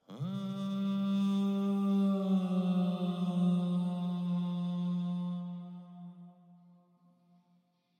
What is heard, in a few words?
vocals back automation